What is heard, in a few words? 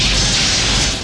Phaser